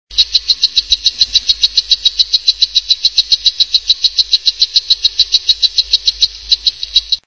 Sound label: Sound Oophaga Pumilio; Canto; O. pumilio "Colon"; Dendrobatidae